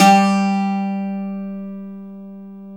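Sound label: Bouzouki G 1, Mediteranean Traditions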